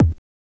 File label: Kick, techno drum